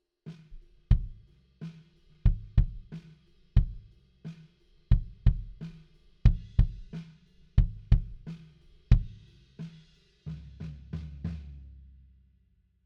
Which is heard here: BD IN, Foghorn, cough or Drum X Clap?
BD IN